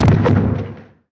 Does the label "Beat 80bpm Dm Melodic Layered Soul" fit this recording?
No